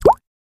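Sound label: Drip Single Falls Liquid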